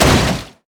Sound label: steam golem basic attack; LoL; blitzcrank